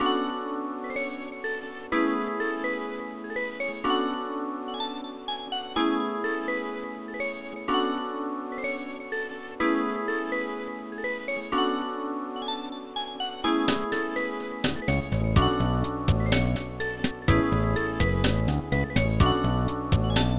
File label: tune; golf; AMIGA CD32; Protracker Module; faldo(pc-league)